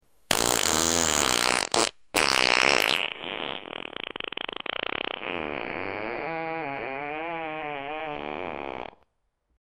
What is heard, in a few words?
long and sharp fart sound; sound effect; body sounds